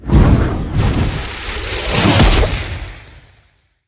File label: volley of fireballs; battle